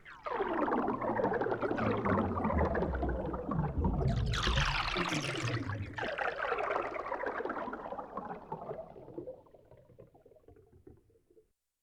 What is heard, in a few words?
pouring down stereo, Yamaha TX16W; Sound FX